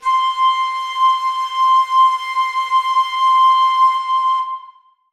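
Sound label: flutes sustain c5; Sonatina Symphonic Orchestra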